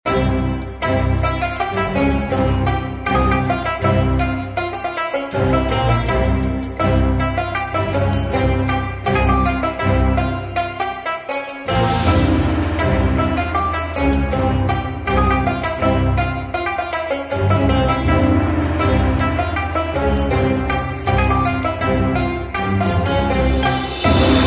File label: indian arabic mix ringtone